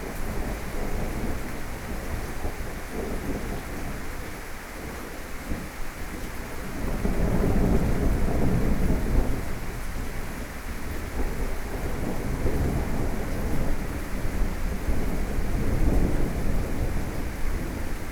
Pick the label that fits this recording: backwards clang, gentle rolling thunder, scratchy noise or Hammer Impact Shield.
gentle rolling thunder